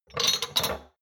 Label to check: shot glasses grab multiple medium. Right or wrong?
Right